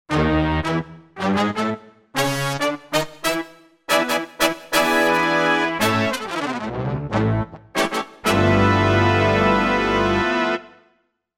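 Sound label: Brass Section, Roland Fantom XR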